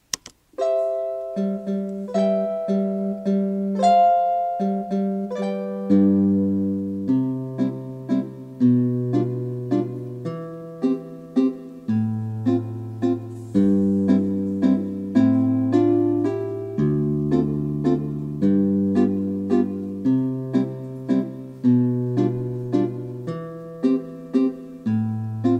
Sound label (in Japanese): エーデルワイス③（伴奏のみ）; 成隣小学校 WEB音楽室